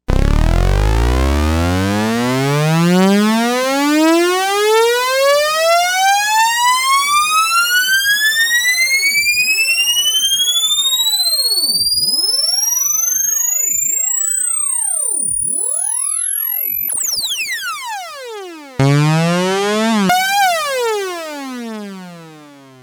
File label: Dirty Riser, Novation UltraNova; ChuckieSoundpack